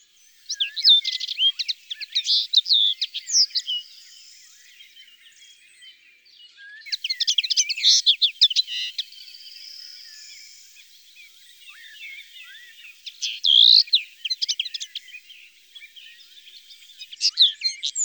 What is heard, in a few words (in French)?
Linotte melodieuse, ABC des chants d'oiseaux